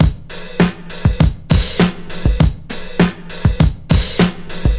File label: 100 bpm mover, drum loops and breaks